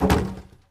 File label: close, barrel; Minecraft; Conquest Default